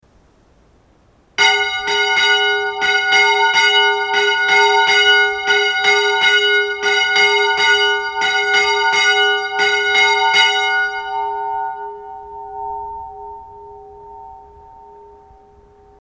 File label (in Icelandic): grundarkirkja minni; eyjafjardar og thingeyrarprofastsdaemi